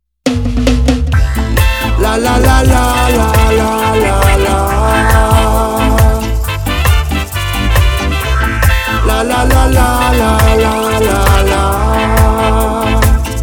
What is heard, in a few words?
reggae ska